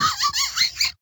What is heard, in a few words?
Mouse long